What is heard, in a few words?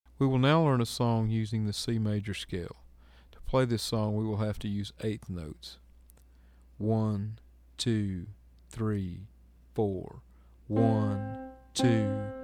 C Major Scale Song